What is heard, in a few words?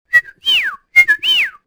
whistle; Soldier Of Fortune II Double Helix; XBOX